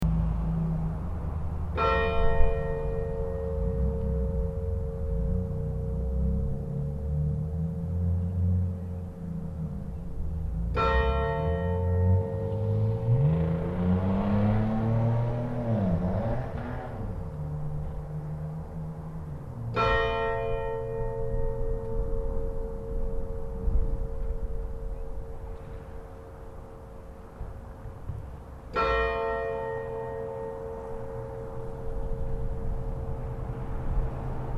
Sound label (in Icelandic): landkirkja likhringing